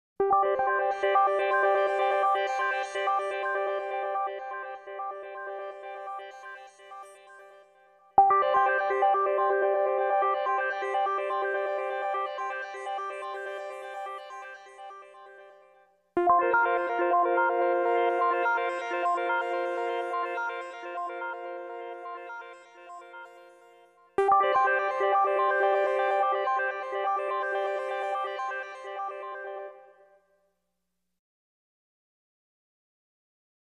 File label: Rhythm